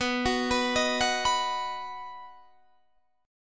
B-Major B down Guitar Standard; B arpeggiated; chord shape; JGuitar

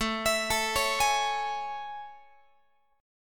A Major 7th A, Guitar; AM7; arpeggiated; x,17,19,19,17,19

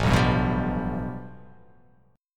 A 7th Suspended 2nd Sharp 5th

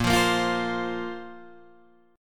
Bb Suspended 2nd, Guitar, Open D

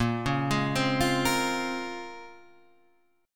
A sharp Minor 9th, A sharp, down; arpeggiated

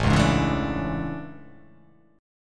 A Major 11th, down; AM11; strummed; JGuitar

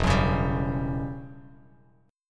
E Minor 6th Add 9th E down Bass Standard; Em6add9 strummed; JGuitar

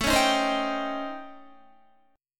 A 7th Sharp 9th Flat 5th, Guitar; A7#9b5; strummed; JGuitar

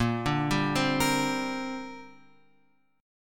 A sharp 6th Add 9th chord, Guitar, Open F tuning; A#6add9; arpeggiated; x,5,2,2,3,5

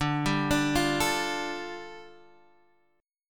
G 7th Suspended 2nd, D, guitar chord

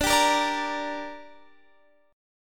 D Suspended 4th Sharp 5th, Guitar, Drop D

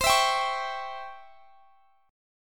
Db Minor Major 7th Flat 5th C

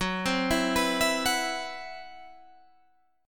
C Major 7th Flat 5th F sharp

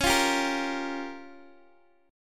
C sharp 6th Flat 5th C sharp down Guitar Open G

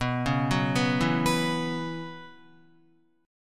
B Suspended 2nd Suspended 4th, Guitar; Bsus2sus4 arpeggiated; 4F,4A,5C,5G,5C,6E; 6,4,6,4,4,7; JGuitar

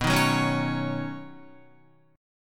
B Major Add 9th, Badd9 chord, Guitar; strummed; JGuitar

